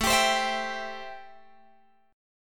A-Minor 7th A Guitar Open G

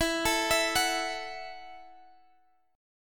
Gb Minor 7th

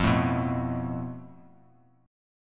F Major Flat 5th, Bass Standard; strummed; x,8,7,4; JGuitar

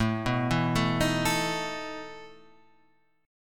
E Major 7th Suspended 4th, A

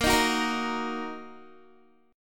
Bb Suspended 4th Sharp 5th Bb down Guitar; strummed; tuning 4E,4A,5D,5G,5C,6F

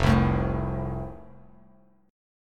F Major, Bass Standard; strummed

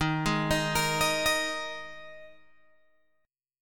D sharp Suspended 4th, Guitar, Open G

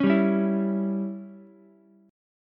C sharp Diminished C sharp, Ukulele; strummed; chord; 6,7,9,7; JGuitar

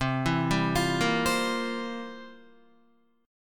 C 11th C down Guitar; C11 arpeggiated

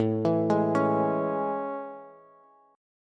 A Major 7th A, Bass; AM7; arpeggiated; JGuitar

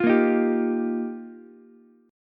G Minor 7th Sharp 5th, Ukulele; Gm7#5; strummed; JGuitar

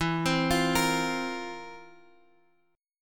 F Major 7th Suspended 4th E

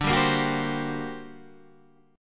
G Minor Major 11th, D

